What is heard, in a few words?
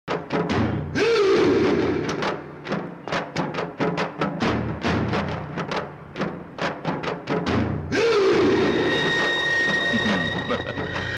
mass bgm; thirumalai